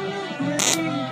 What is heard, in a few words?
glitch